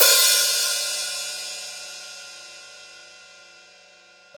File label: big ride d sharp key, Royality free ride cymbal drum sample tuned to the D# note. Loudest frequency: 6782Hz